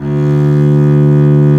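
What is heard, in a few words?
DBL BASS D2, double bass; AKAI S-Series CD-ROM Sound Library VOL-2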